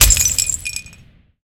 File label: symbol explode; shangrila